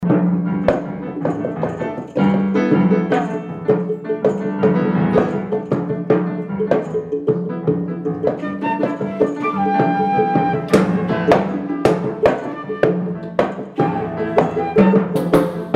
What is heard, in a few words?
Boxwood Rhythms